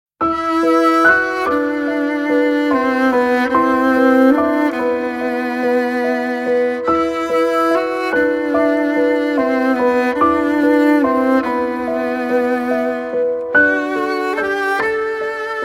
Song Sad words

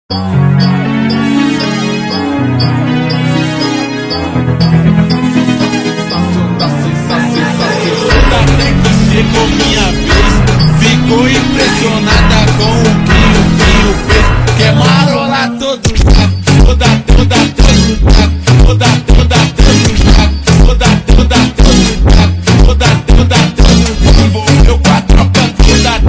FUNK

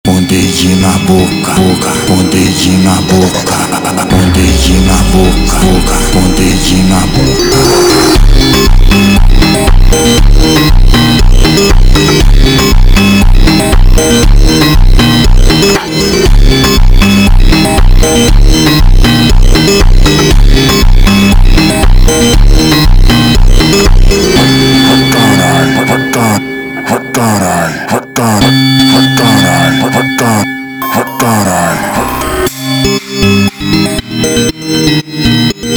Funk sped Up

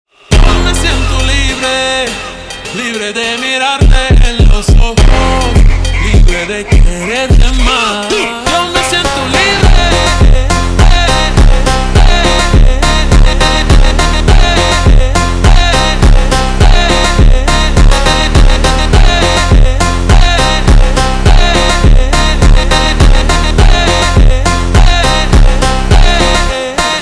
Funk super Slowed

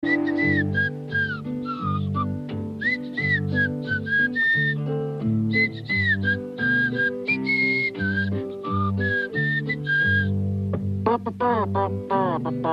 whistle, ringtones; robin hood; Disney

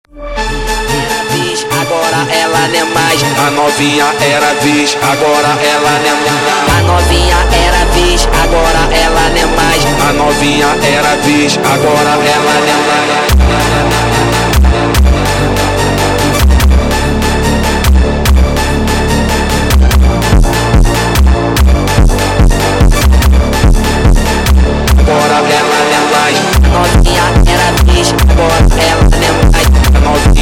Funk sped Up

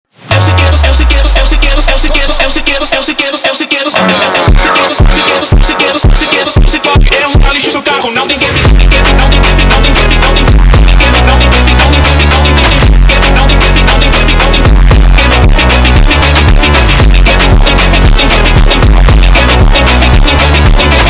Funk

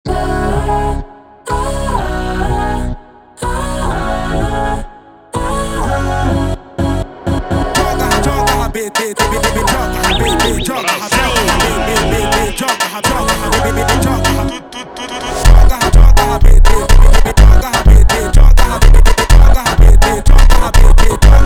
Heavenly Funk slowed

